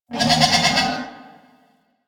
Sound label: Ram Ambient Call; Animals; DE; Warframe; Quests; Duviri